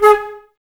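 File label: flute, Woodwind; Miroslav Vitous; Woodwind Brass Ensemble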